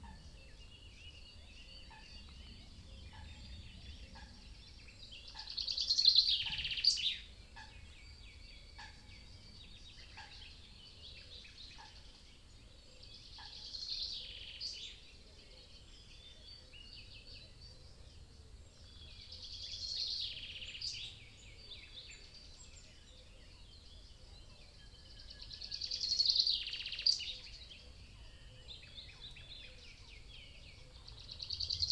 Nature European Wetland Dawn Chorus Stereo Binaural